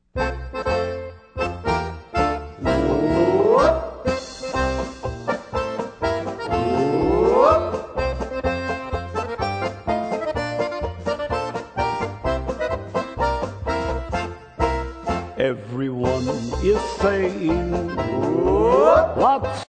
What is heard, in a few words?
POLKA